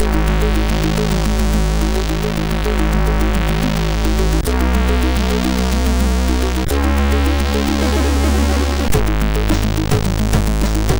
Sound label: Hell Machine, MOS6581R3